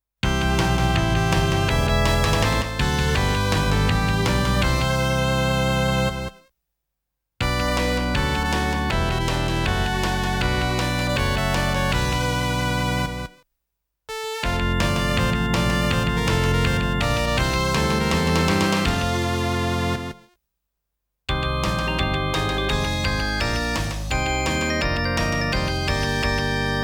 Rooters' Song Electone Medley; Namco Game Sound Express Vol.21; Great Sluggers

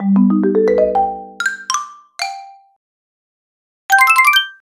JINGLE - 1-Up; Nintendo Land; Wii U

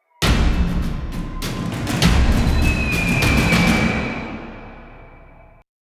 Sewers Combat Intro; Novigrad

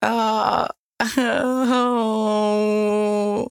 moan

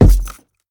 Footsteps Wood Run; The Town

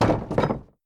Cart unlock; adams venture origins

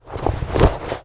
accelerate foley

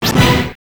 COIN; Final Blow; arcade

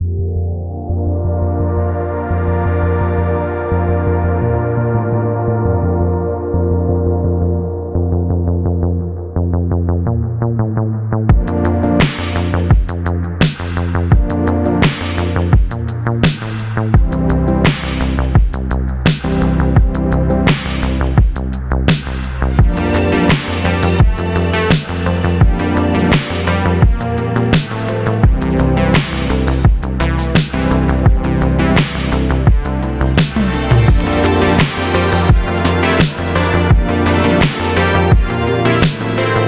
Synths and Waves; cloudpunk

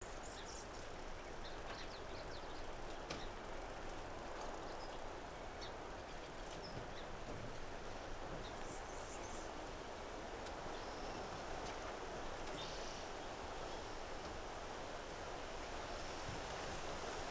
Indian Village amb

